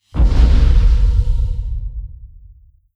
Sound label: distance stellarite attack plasma; Stellaris; guardians